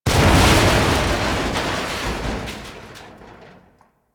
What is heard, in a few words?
Destruction Explosions Two; Ambience; Warframe; Corpus Railjack